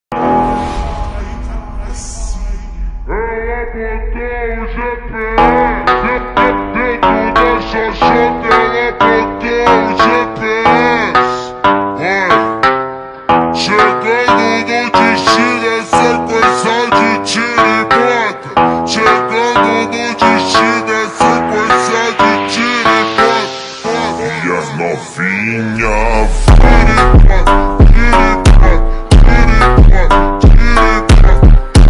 ultra slowed high bass boosted

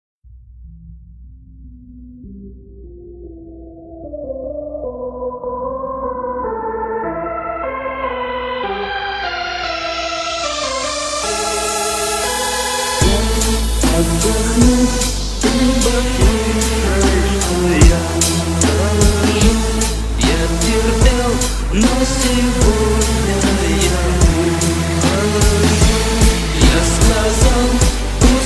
hardstyle

